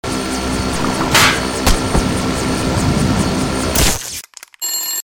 Grapple Gun; Infiltrating The Airship

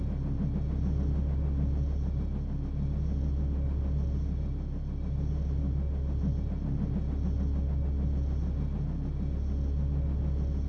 Drone

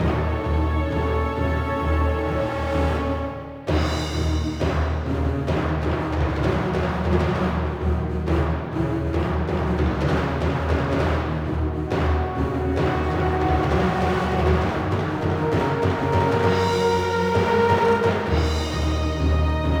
Tension Loop; Might and Magic Clash of Heroes

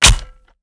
machinegun empty

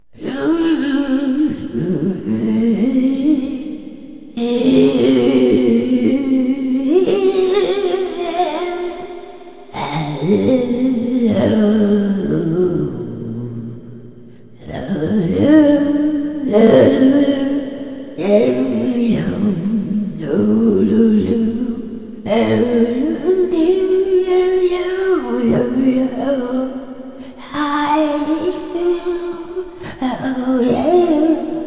singing

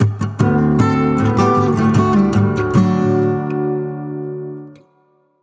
complete sting

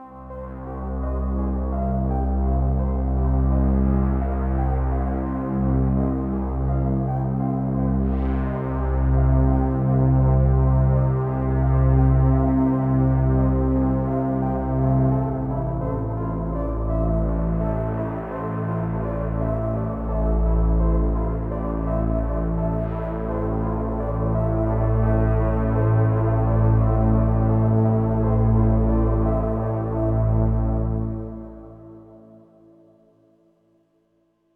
Day Stinger

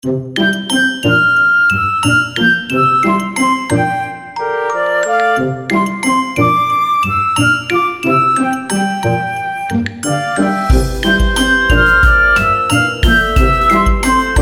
Interior House; Nexomon

